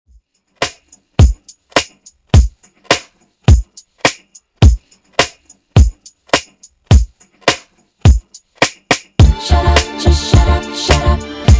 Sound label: Redrum 105 Bpm